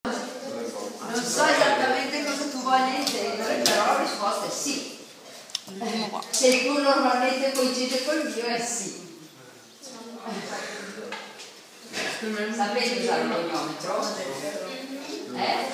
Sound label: piano